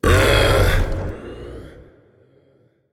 "ugh"; smite; sylvanus

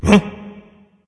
confused; cernunnos

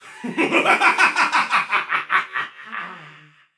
laugh, voicepack; smite; jorm

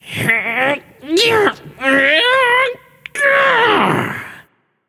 grunt lift, voicepack; smite; jorm

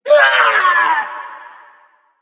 grunt hurt; smite; thanatos

